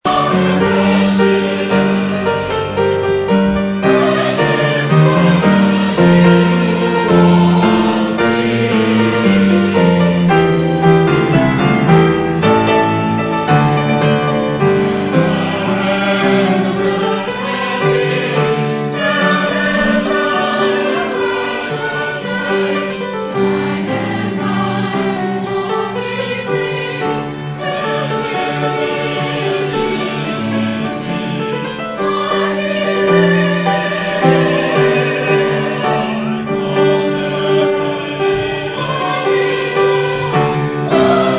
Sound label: Choir; taiwanese